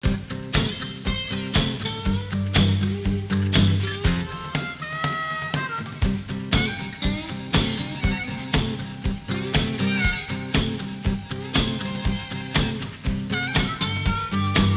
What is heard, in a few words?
western Beat